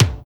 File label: BUM KICK; Drums In Your FACE!